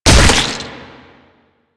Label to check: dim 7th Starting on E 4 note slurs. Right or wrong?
Wrong